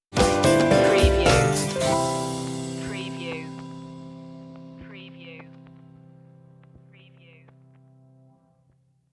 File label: jazzy stinger; media music now